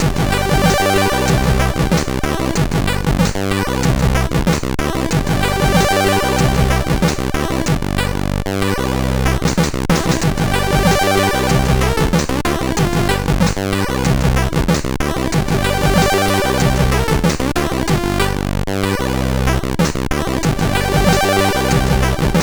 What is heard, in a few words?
house party, CSG8580R5